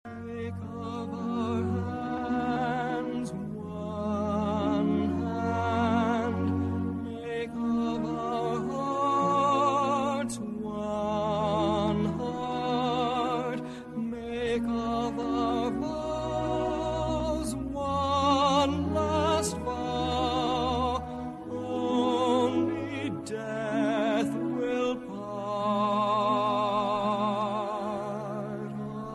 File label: vocal, string quartet